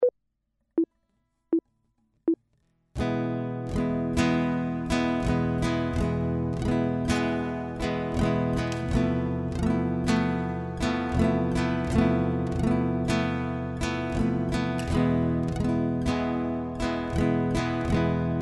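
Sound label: chord, guitar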